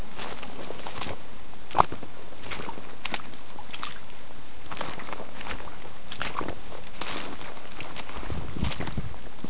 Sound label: snow walking